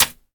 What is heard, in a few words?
Warrior Attack Hit; We Are the Dwarves